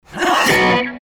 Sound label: Guitar Sneeze; Best Of N2O Library; Breakers & Transitioners